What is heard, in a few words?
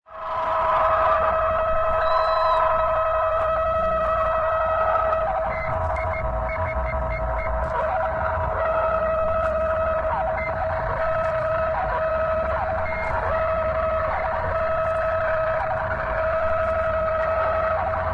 noise